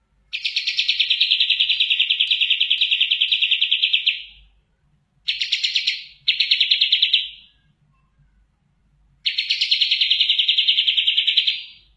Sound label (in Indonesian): kapas tembak suara asli